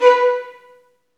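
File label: STR, Roland String Master Series, Violins Marcato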